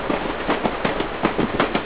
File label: CHUG